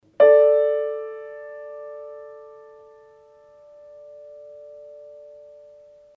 piano fourth A D

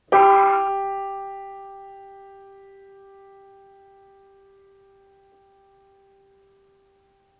piano G

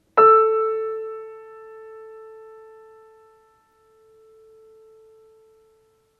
Kawai twelfth A E

